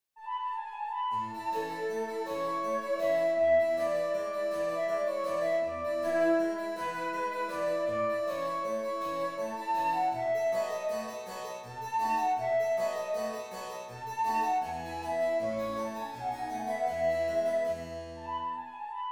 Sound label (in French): menuet quintette cordes; boccherini luigi